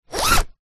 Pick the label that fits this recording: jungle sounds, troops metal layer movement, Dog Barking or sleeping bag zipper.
sleeping bag zipper